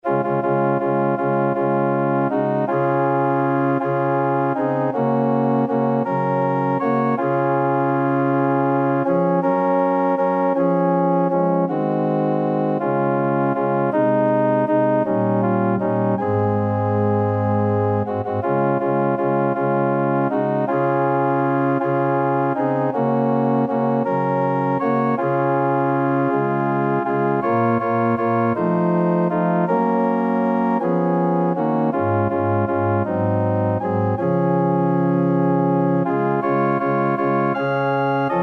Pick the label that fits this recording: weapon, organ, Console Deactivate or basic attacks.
organ